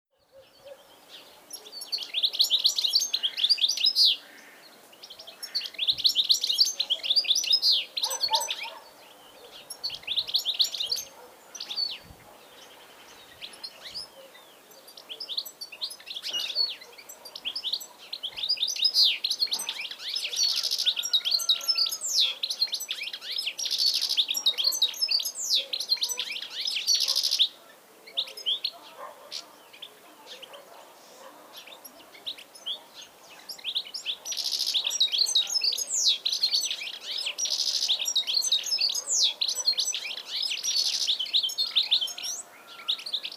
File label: Carduelis carduelis; SETE